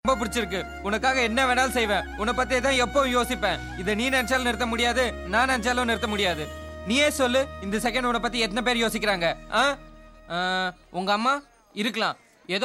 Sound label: real tamil feel